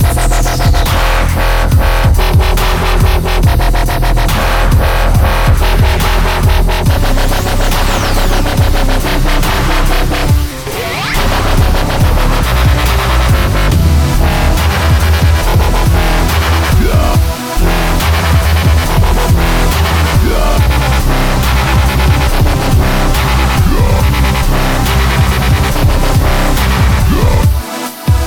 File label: Hyper Jump Music; super mutant alien assault; ps vita